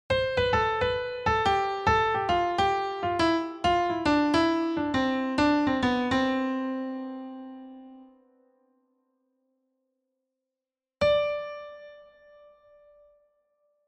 major scale syncopated descending pattern

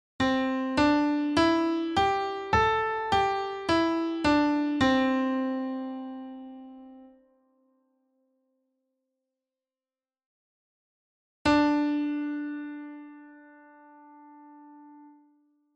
major pentatonic scale

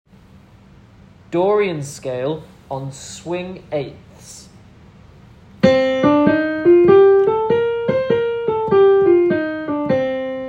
Dorian scale

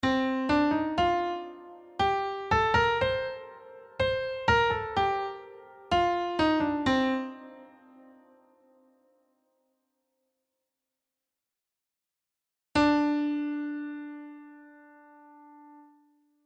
dorian scale

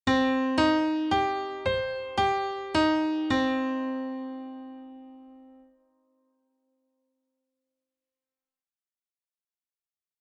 minor chord arpeggio

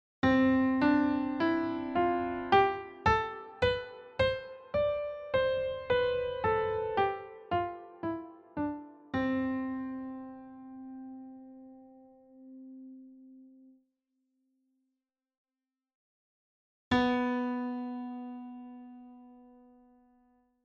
major scale up to the ninth degree

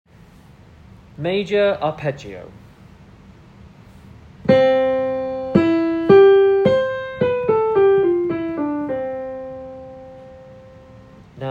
Major arpeggio

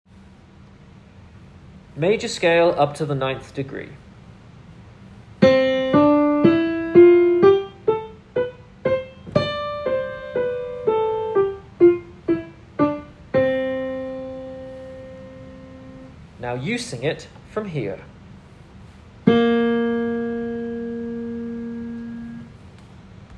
Major scale to 9th degree

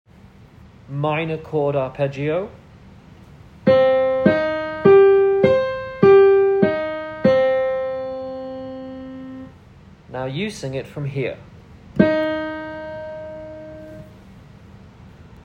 minor chord arpeggio

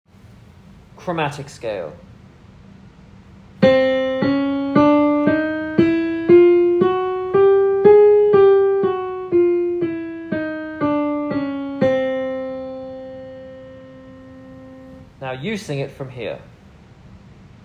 Chromatic scale

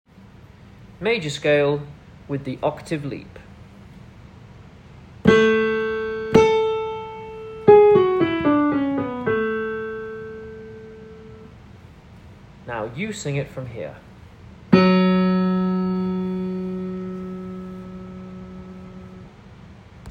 Major scale octave leap

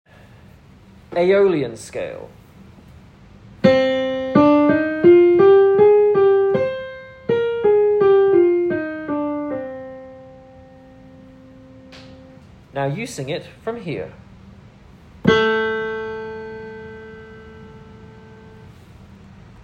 Aeolian scale

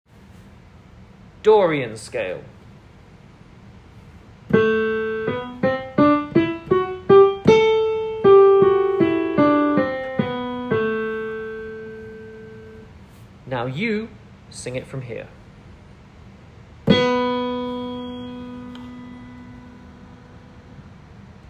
Dorian scale

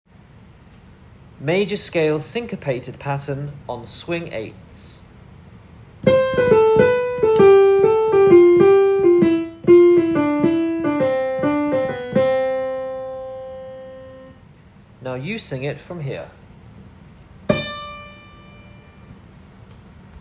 major scale syncopated pattern

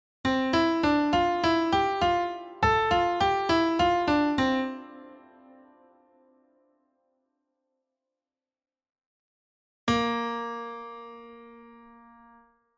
major pentascale alternating thirds

